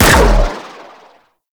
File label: Briefcase Akimbo Pistol Shot; Warframe; Corpus